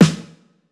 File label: Classic hiphop snare